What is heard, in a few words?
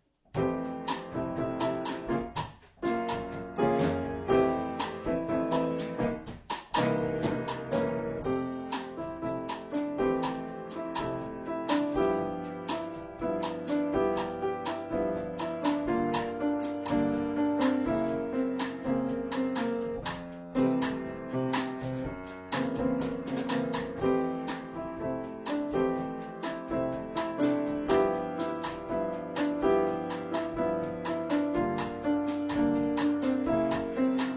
piano drums